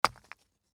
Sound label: Hit Rock With Sledgehammer, Hitting Rock With Sledgehammer